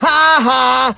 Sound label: Haaahaaa; Simpsons